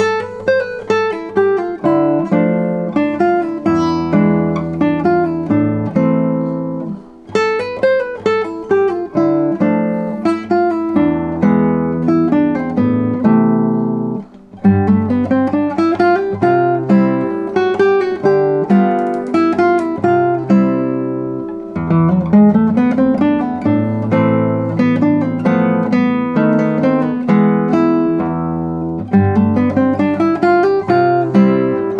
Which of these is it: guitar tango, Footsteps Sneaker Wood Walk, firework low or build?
guitar tango